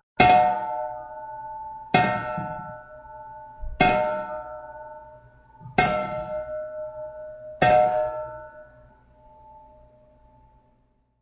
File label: Big Old Bell Multiple; Bells; Musical; SFXsource